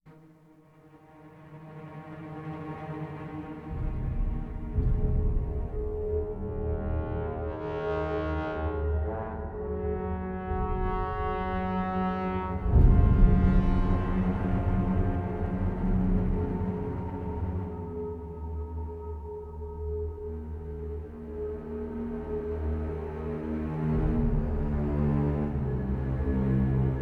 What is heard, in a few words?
ambient; gears of war unreal warfare 2006; pre ue3 switchover